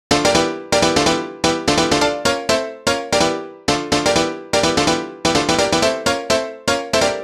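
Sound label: Piano 126 E minor Dry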